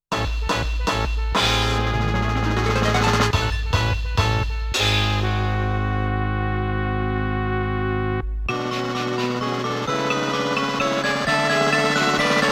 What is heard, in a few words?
All Rounds Clear; pulirula